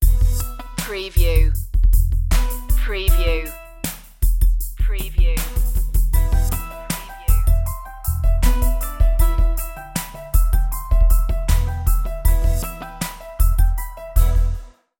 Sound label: gangsta stinger